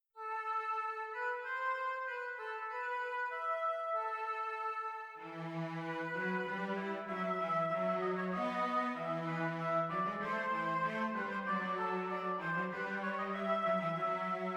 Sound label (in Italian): Orchestrazione; Ronge; Gerd Prengel